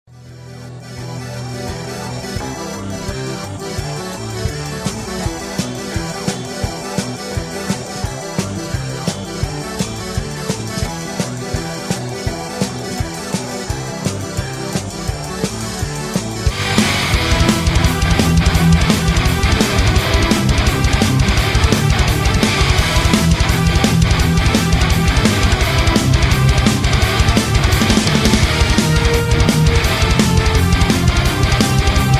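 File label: The Instrumental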